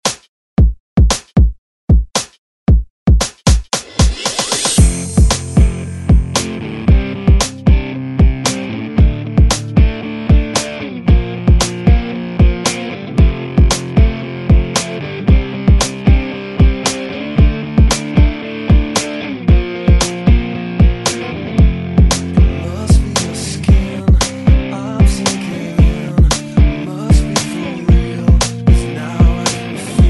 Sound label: 90s Rock ReDrum 114Bpm; DJDOLLS